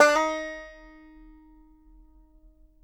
BANJO NOTES, EB4; Sampleheads; Whole Lotta Country 1 & 2

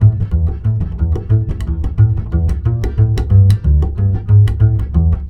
BASS, Balkanish Traditions; USB Soundscan vol.63; AKAI

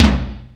DRM, YAMAHA R5+RX; Best Service ProSamples vol.55 - Retro Sampler; AKAI

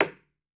f#2, Talking Drums; Retro Sampler; EMU E-III

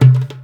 DARB DUM, ETHNIC PER; AKAI S-Series CD-ROM Sound Library VOL-5

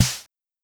Snare, Fricke MFB 502

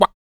KUK, MALE PERC

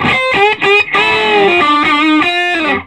SOLO, Vintage Blues Guitar; USB Soundscan vol.22

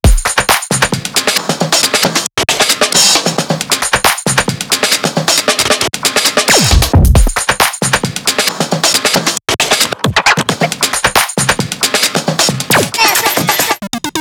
chopped break 35bpm

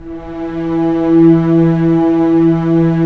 STR, Roland LA Composer

